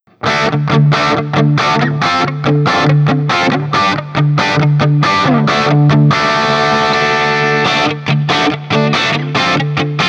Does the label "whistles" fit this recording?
No